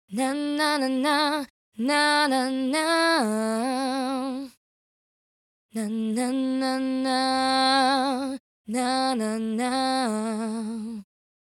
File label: VOCAL DRY